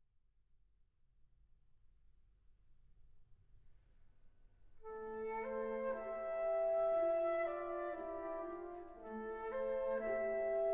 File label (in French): Pavane; de la Belle au bois dormant